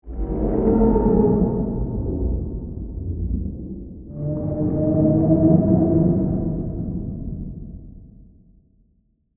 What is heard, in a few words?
Sea Creature Move, Horror, Moan, Whale, Song; Monster Sound Effects; SoundEffects-BlastWave